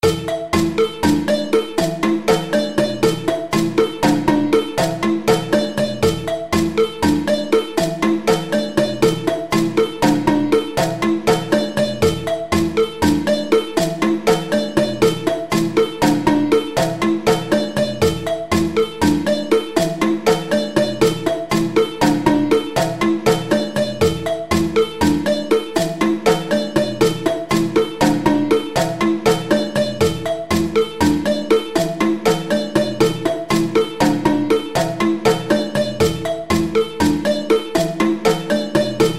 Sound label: Fladon Groove 120 bpm, djembe